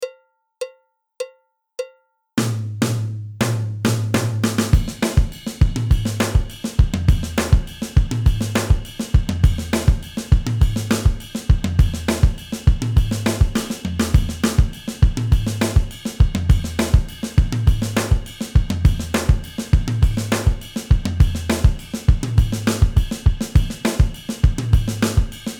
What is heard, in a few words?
songo style, drums